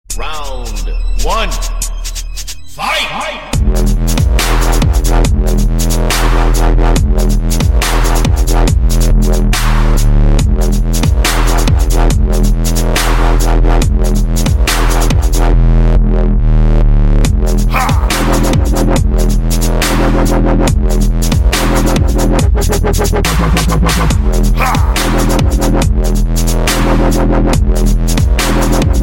Dubstep

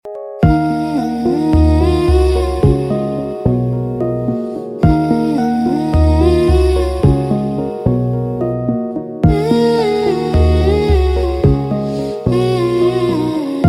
Hmm Hmm Music

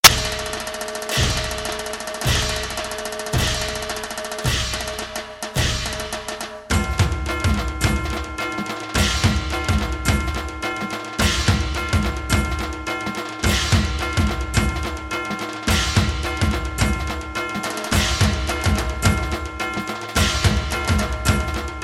dhol; nashik; alternative

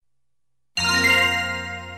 Quick Messages

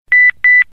4 beeps; ringtones; sms

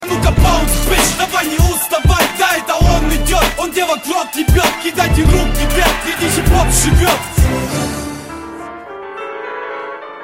RAP

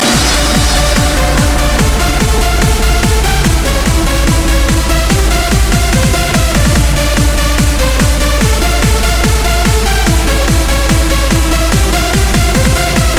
The Bass

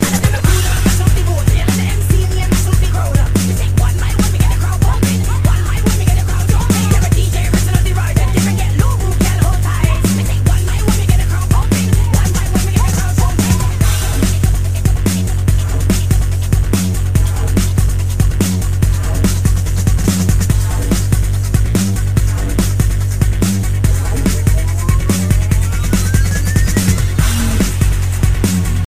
BreakBeat

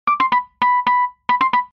SMS Morse Code Wurlitzer

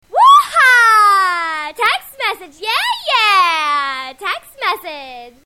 vry funny text msg; ringtones; sms

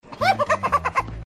Laugh

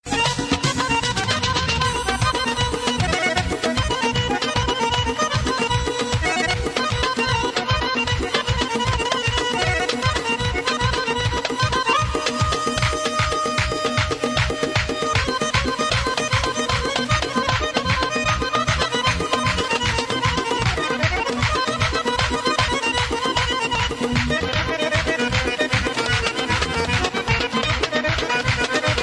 arabian ring, reggae

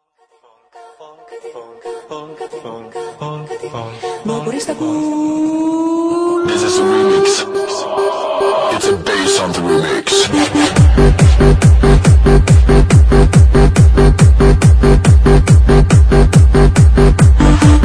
trance polka remix

